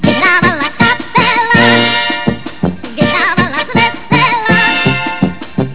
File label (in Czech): kapela